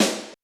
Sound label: Roland U220 Electric Set, Drum Machines